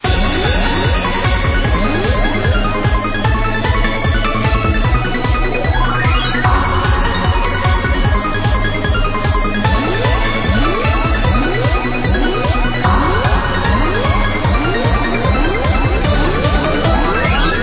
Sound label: BGM mega win; football; Brazil